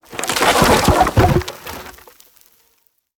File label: impacts; World of Tanks